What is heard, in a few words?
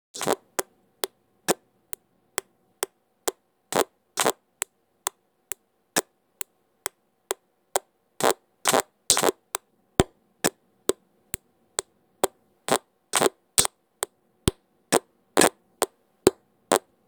PCM5100 output